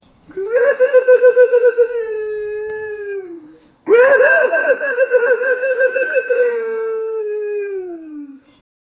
homeless man crying for h, distress

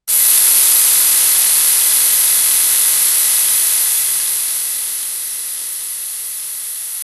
haha sound, vocal

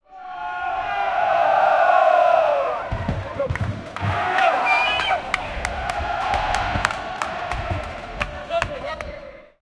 basketball practice, indoors

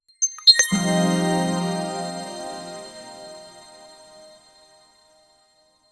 soft magical pop or shimm; sparkle

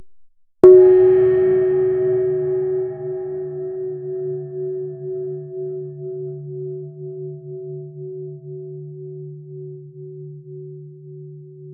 agung

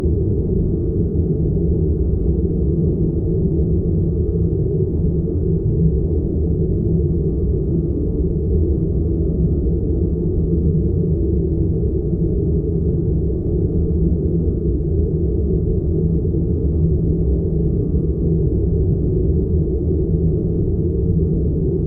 dark room ambience sound; indoor

